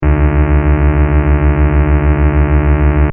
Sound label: Scifi SFX Loop, Short; Sci-Fi (Sound Effects:Science Fiction)